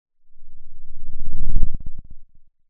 pop

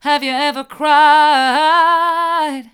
have you ever cried hard, vocal

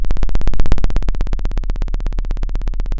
pop, Sound of a drum roll

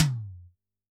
Tom, drum

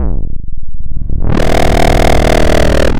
pop, chainsaw

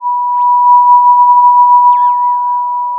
Sound of a police car siren

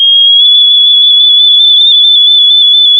pop, sound of a tea kettle whistling

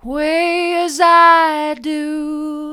way as i do soft, vocal

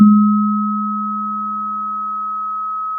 pop, Sound of a church bell

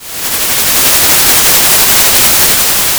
Sound of a thunderstorm

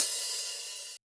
Op Hat, drum

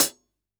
ClHat, drum

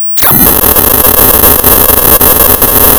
Sound of tearing paper, center

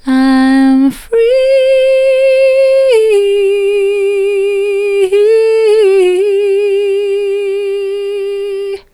i'm free soft, vocal

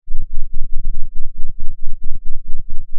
pop, Sound of a thunderstorm

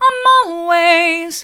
i'm allways hard, vocal

